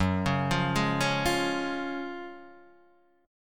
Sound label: F sharp Major 7th Suspended 2nd, F sharp, down, Guitar, Half Step Down